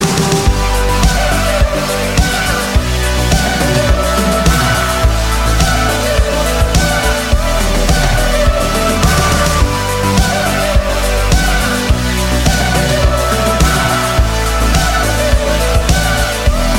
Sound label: hip hop music tone ringtone